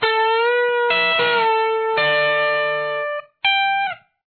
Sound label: lead, guitar